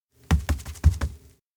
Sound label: steps tatami circle around other side; anemoi